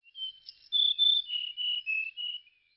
bird

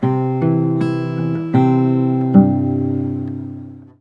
LUTE

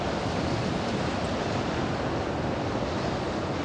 WIND HURRICANE

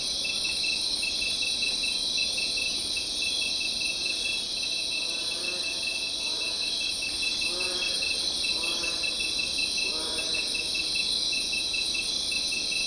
night tons of insects

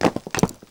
crumble

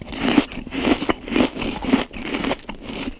eat bread

